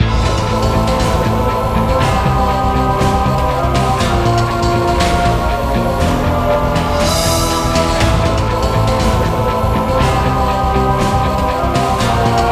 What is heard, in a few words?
Music Game General event